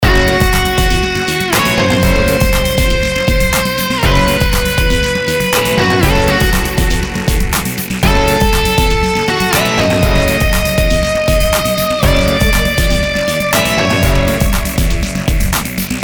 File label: action spy film background